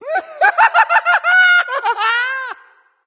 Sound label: laugh; Nutcracker Ah Puch; Smite